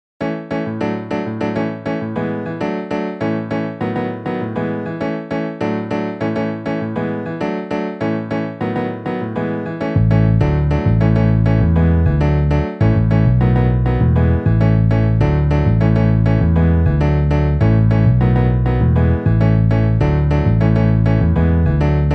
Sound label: Piano Bass MIDI